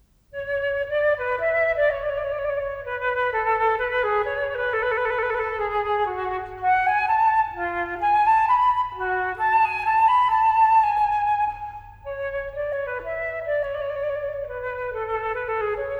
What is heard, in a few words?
Solo Flute; Telemann; TWV40-11